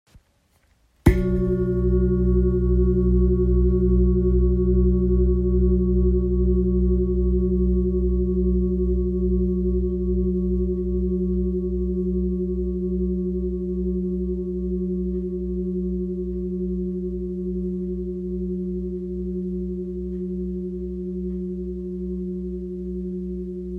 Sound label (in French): Bol grave pieds fa diese